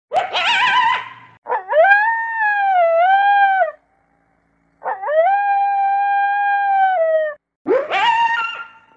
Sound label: cartoon wolf